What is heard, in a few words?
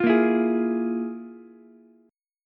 F Minor Major 7th Double Flat 5th, Ukulele; FmM7bb5 strummed; JGuitar